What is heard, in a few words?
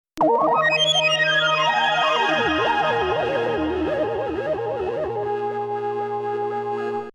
Synth